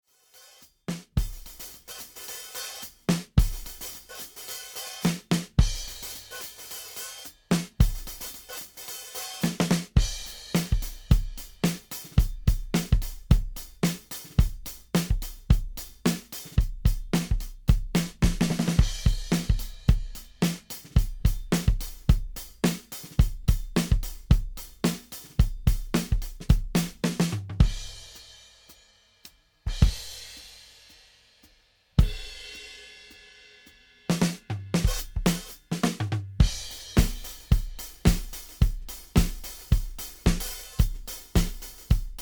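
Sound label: new wave, full mix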